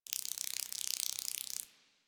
Camp Insect Flyby; Ambience; One Offs; Insects; Warframe; Quests; The New War; Drifter Camp

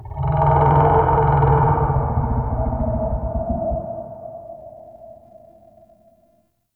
Mystical Tree Creak, Wetland Forest Day; Ambience; Midrath

